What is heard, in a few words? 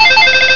PINBALL